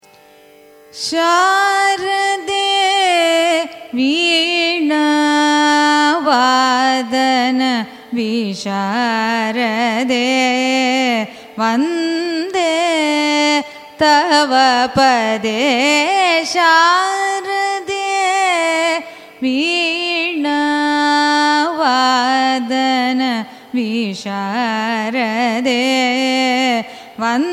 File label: raga devagandhari carnatic